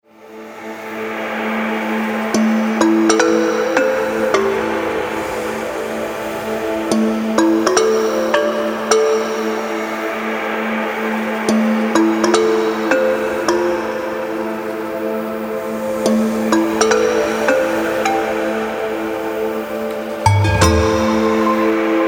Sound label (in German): Wecker Wellness, Markensound; Allgaeu